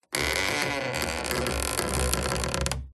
Stress Creak Squeak Groan; PMSFX

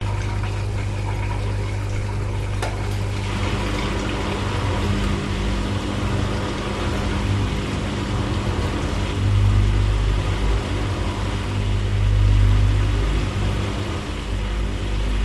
household washing machine start of spin cycle

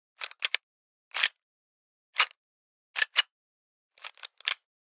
Pistol USP Bolt Slide; noise creations

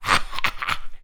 Human Evil; little robot sound factory

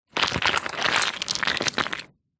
foley wet stones and pebbles movement; zapsplat

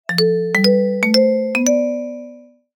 cartoon ascend mallet steps slow; zapsplat

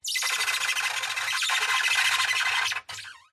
Wood Stress Creak Squeaks; DPA4060; pmsfx

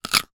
human bite cucumber

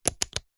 wood blocks small x3 stack fast; foley

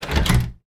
household door handle movement; sound effects one

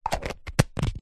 household shaving foam can plastic lid put on

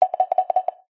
cartoon knocks wood vintage echo

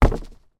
human jump land on wooden boardwalk; sound effects one

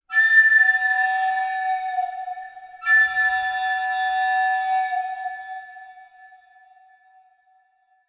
horror screech reverb recorder; zapsplat

